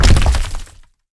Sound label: land, pointed dripstone; Minecraft; Conquest Default